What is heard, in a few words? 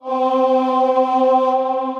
Driving Choir Male; Voices